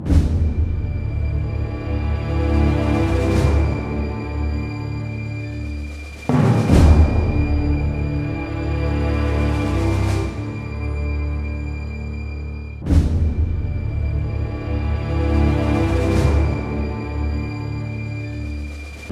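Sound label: 72 danger, orchestra; East West; Scoring Tools; Danger There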